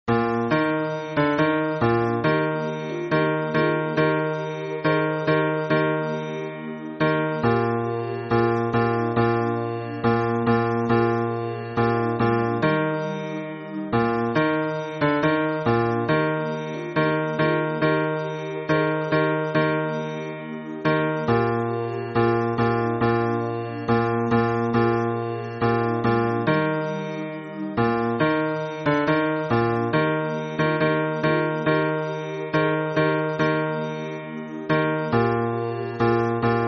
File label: hymn bass